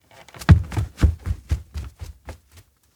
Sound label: steps indoor log house run away; ken; anemoi パッケージ版 1.1